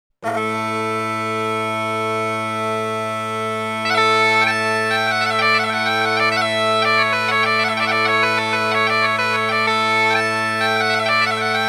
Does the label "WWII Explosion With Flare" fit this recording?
No